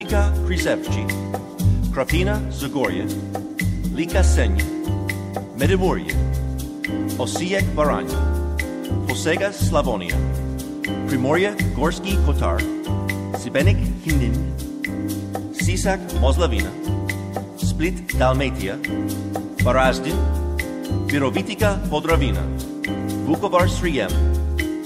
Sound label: croatian ringtone; croatian provinces